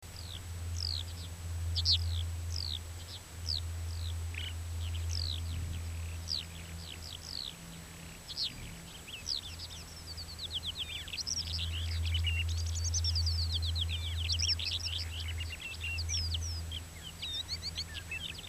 Melanocorypha mongolica song, background other male